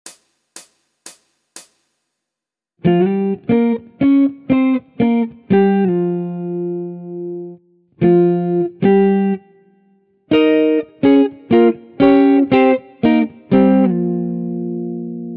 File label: Jazz Chord Melody, Guitar